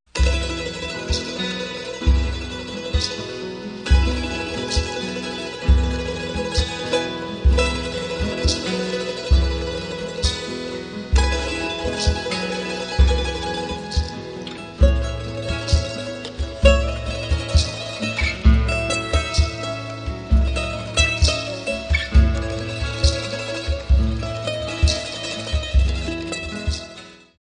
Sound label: andean